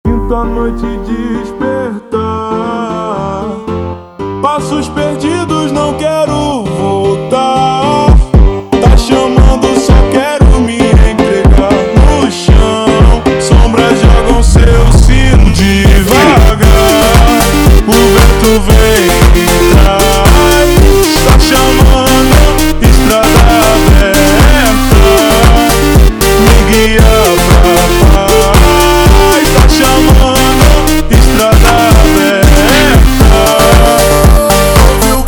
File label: slowed, فانک